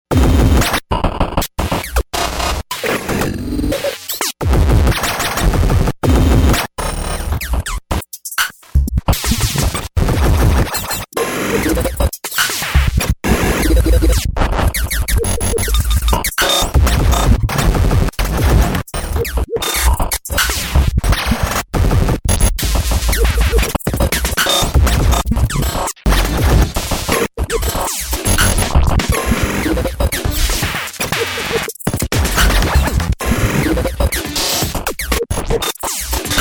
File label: Glitch FM